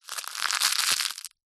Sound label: egg shell crush in hand; zapsplat